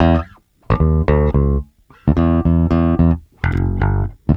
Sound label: Bass Loop, Ambient Grooves